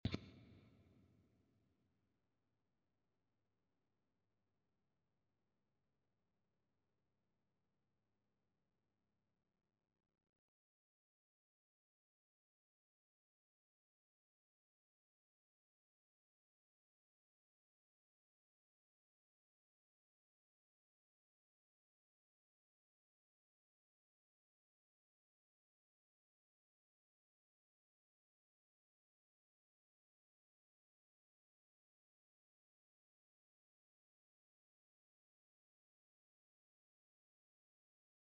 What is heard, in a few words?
Interlude Guitar Rhythm Fill